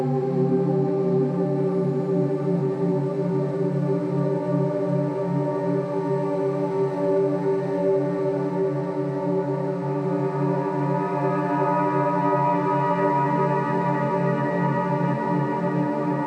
Hallowed Fog Heavy, Horror Atmospheres Music

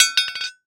Metal Pipe Drop; MinorHallutinationsSounds1

